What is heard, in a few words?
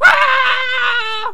falling; player